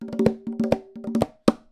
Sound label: Fill, Conga; musicradar